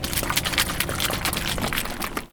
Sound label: Foley